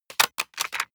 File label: plastic device handling rattle